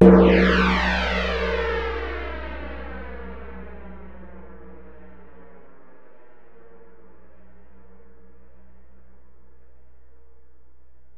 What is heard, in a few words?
Ride, Alesis DM-PRO, Drum Machines